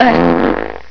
fart; hl2dm

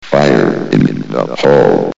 fire; hl2dm

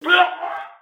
scream

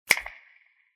emote snap; fortnite emotes

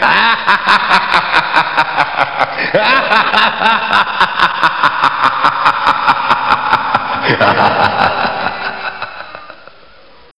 laughter; quake; price

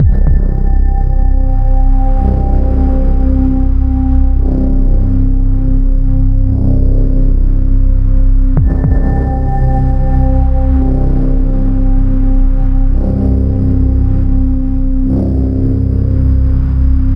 music default ambience

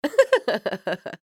sniper laugh happy; Kinessa

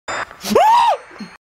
wooh; tf; noisemakers

